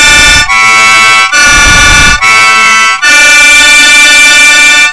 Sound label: Harmonica